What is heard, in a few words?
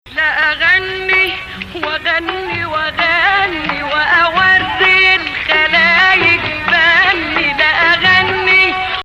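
Rast Sikah, Arabic music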